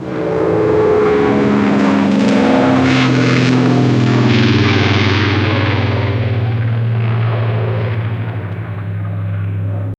a propeller plane going i; airplane

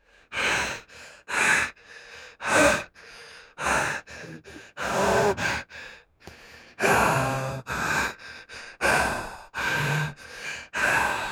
young man having struggle

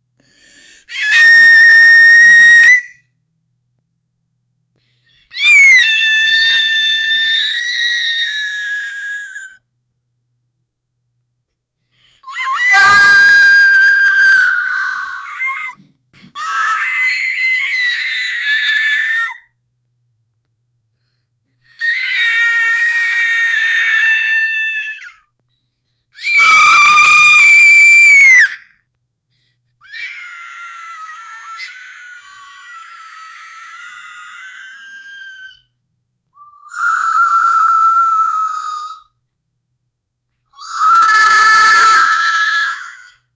a high pitched scream, anguish

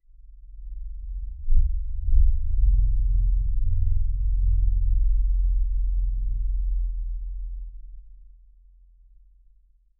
low subterranean drone at

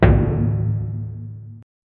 monster heavy single foot; footstep

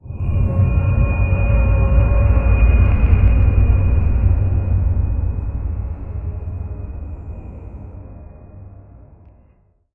impact

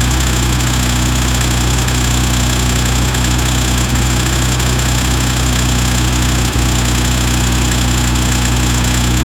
straight piped inline 6

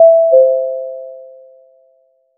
modern elevator arrival c

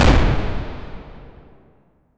a short sharp explosion; mining

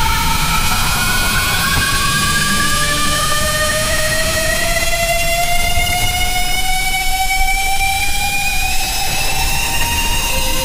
quick sharp bursts of las, laser

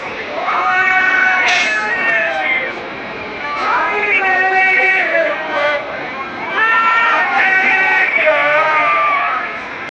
slogans in boxing arena, arena

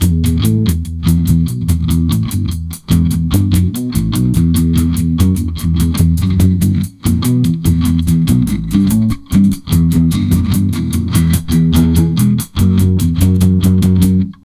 drum bass guitar rok voca

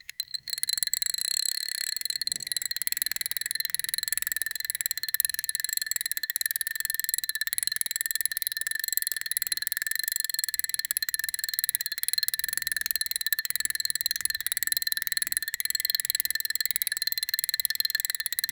mechanical sfx only a mus, music box

